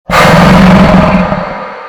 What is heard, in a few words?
jumpscare; saxosaurus; pianosaur curse